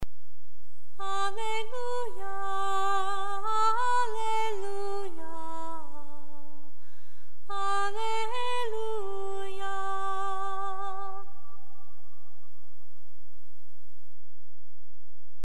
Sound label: chant alleluia